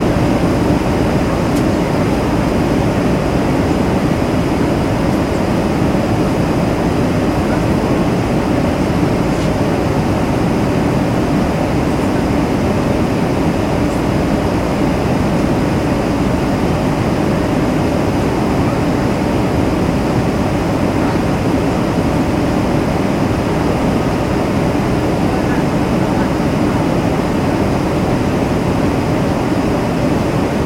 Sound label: aircraft commercial boeing 747 400 interior; transportation; sound effects; sound effects one